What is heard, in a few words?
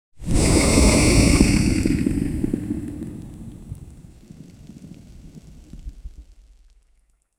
fantasy sound flapping dragon